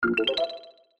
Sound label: game item click; 1casino-prizebox-cyber